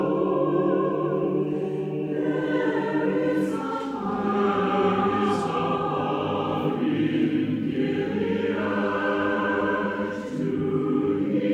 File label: Balm in Gilead Choral arrangement live performance